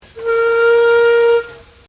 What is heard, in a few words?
Steamtrain Whistle; Bellarine Tourist railway vehicles; Trains